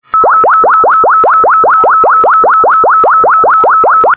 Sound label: volunteer fire pager